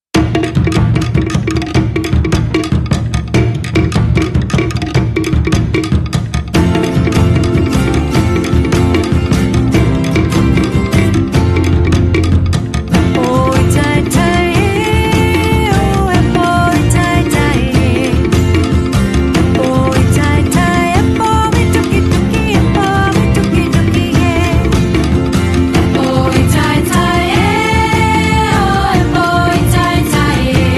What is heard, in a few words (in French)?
chant